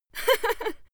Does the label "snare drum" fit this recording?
No